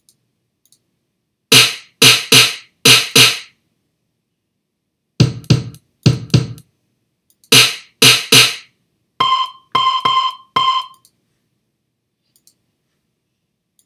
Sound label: inst